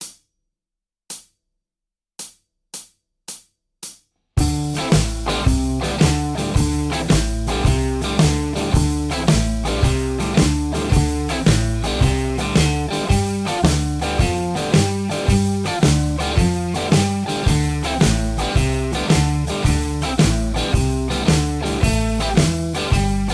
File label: Blues, Guitar Techniques; Guitar Techniques 2019 Issue 290 January